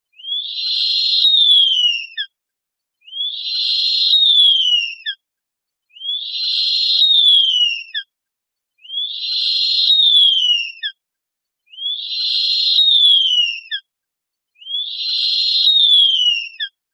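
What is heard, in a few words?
Blakistons Fish Owl Juvenile; Jon Slaght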